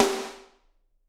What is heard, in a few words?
SD, Drums; Bob Clearmountain Drums I